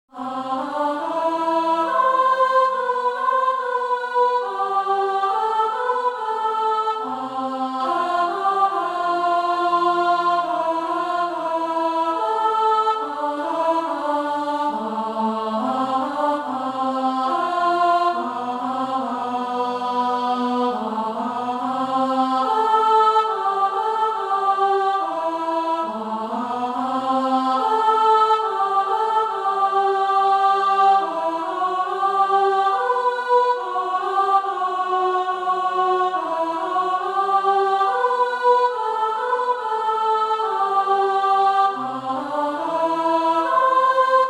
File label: Alto